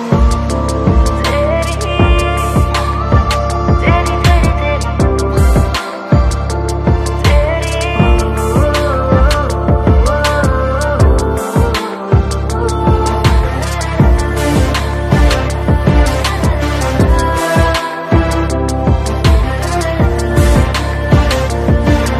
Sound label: Female Version Instrumental